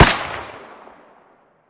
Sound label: shoot last smg; tacz; daffas arsenal